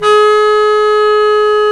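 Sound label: bass clarinet, Orchestral Winds; Roland LCDP04 Orchestral Winds; Lo Clarinets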